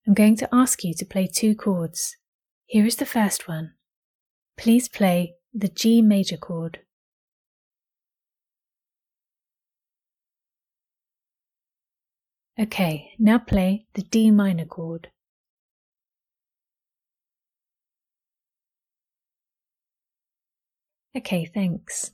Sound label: Chords G Maj D min